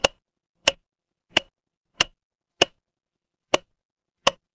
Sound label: instrument wood block